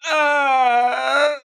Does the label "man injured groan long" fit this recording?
Yes